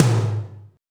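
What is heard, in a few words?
tom, real mega drums; Best Service; Real Mega Drums VOL-1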